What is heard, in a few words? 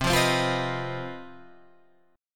C Minor Major 7th Double Flat 5th chord